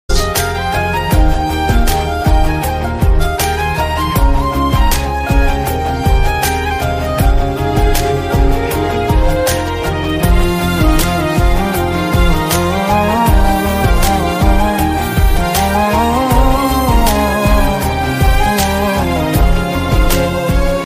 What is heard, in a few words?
instrumental ringtone, flute ringtone